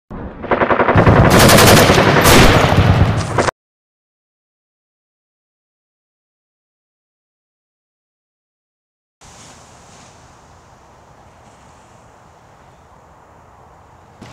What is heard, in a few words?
Amphibious Vehicle Landing Exercise; US Military Videos